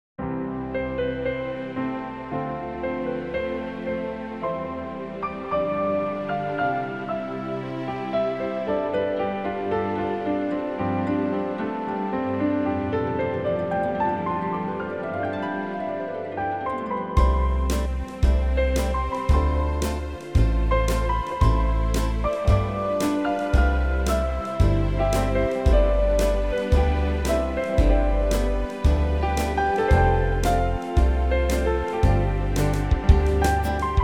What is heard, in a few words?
Foxtrot